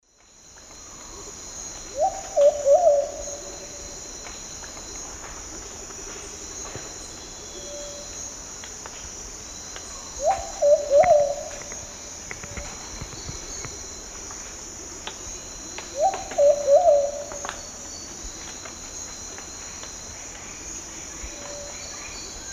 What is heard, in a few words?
Patagioenas plumbea, song; S. America; Eastern Lowlands